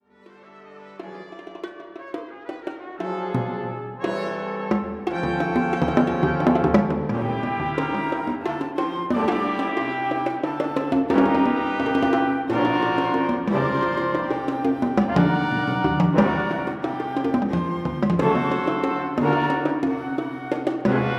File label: Orchestra